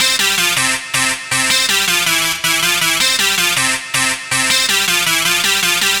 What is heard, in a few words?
Dance Synths, Hardcore Loops, Synth Loops